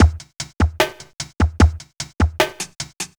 hiphop

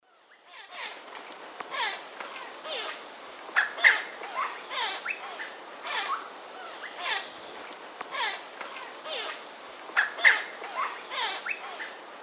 Psarocolius angustifrons, calls; S. America; Eastern Lowlands

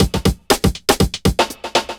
chemical 120, loops, 120bpm

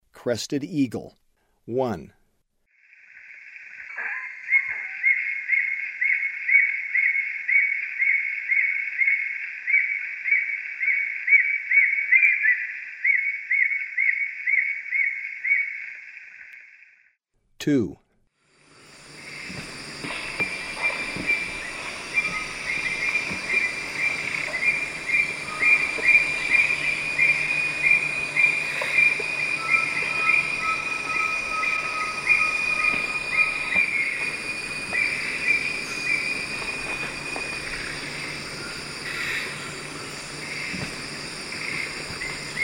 Crested Eagle, Birds of Ecuador; S. America

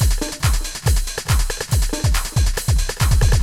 techno 140

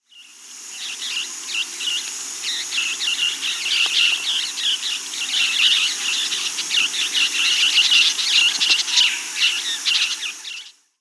Scarlet-shouldered Parrotlet (Touit; S. America

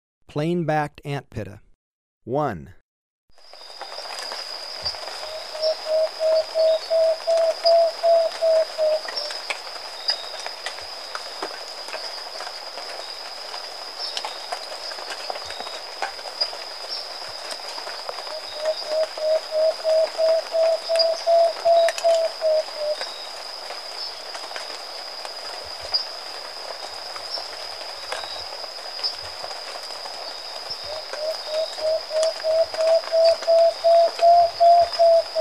Plain-Backed Antpitta; S. America

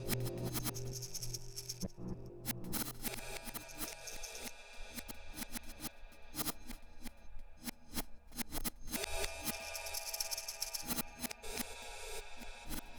Cymbal Spits, Psychotropic Cymbals; Ambient Cinematic

